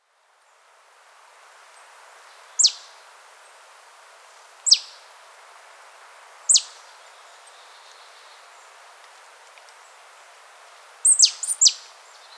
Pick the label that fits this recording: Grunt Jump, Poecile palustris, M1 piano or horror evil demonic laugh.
Poecile palustris